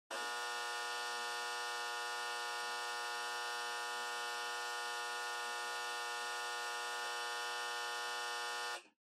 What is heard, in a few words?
Flip Clock Far Buzzer 60Hz